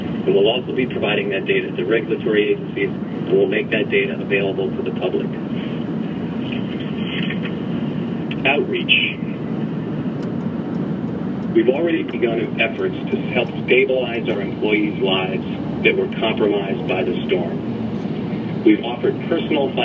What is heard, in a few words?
paper shuffle, Press Conf